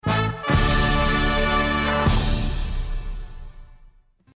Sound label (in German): tusch karneval